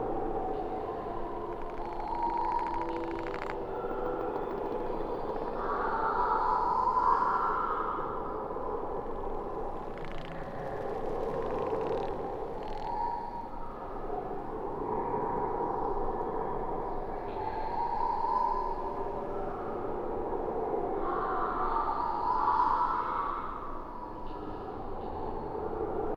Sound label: ambience; Invasion of Naboo; Lego Star Wars (PC)